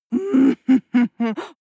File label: female laugh mean